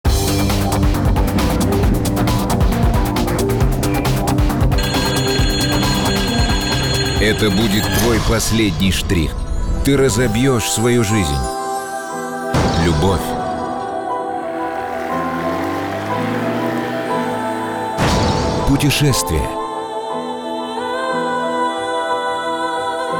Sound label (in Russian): telefon